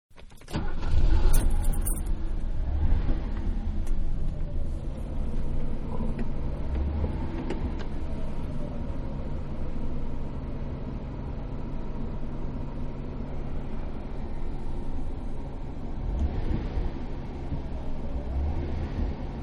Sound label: Foreign CAR Start UP Accelerate, Interior; Cars (Sound Effects:Vehicles); Car Foreign-Start Up And Accelerate-Interior; Manhattan Production Music